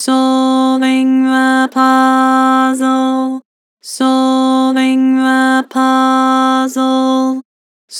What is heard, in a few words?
Vocals, Flat, Solving the puzzle; Harmor